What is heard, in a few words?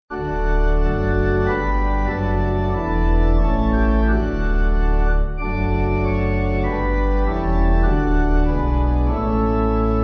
Take My Life, Pipe; Hollingside